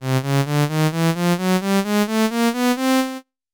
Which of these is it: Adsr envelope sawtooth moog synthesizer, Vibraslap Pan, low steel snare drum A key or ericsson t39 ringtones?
Adsr envelope sawtooth moog synthesizer